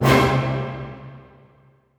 major hit; SoundFactory

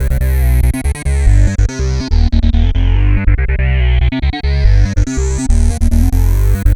Synth Bass

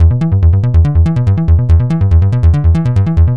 Synth Bass; Basses

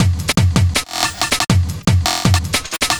Old School Break, Drums

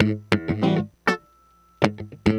Electric Guitar, Loops; Guitars

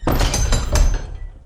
open door; theGoonies; Retro

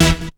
QUIK HIT, STAB; SoundFactory; SMPMANIA

